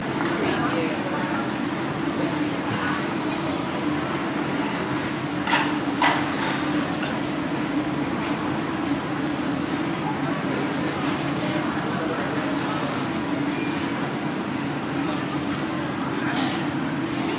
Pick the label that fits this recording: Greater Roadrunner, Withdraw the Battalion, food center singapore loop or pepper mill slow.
food center singapore loop